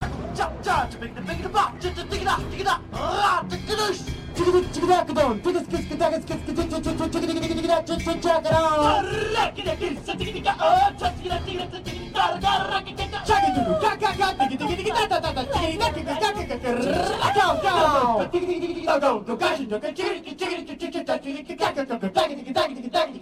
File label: Beat Box